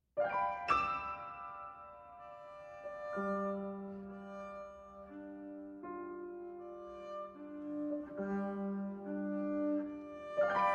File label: For Double Bass and Piano